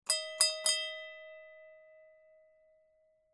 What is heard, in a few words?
BELL PULL